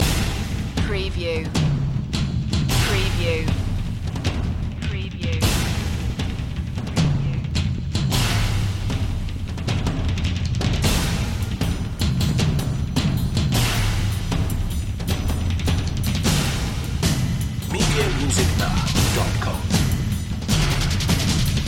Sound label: percussion loop